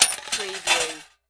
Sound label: Coin Slot